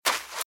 Footsteps Sand Dry Run Right; RadwinIsland

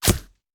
Bullet Impact; Sound Ideas; Guns

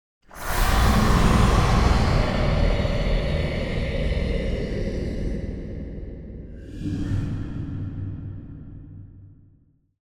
Monster - Distant Death - Long Reverb; Hollywood Edge; sound designer's toolkit v2

